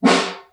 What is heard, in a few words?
SN1 Far, Snare; Ableton Foundation FreePacks; Snare Designer